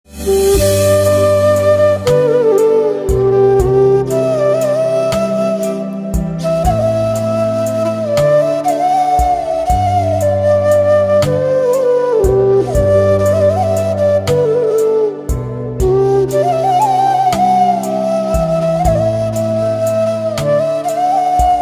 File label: Flute Slow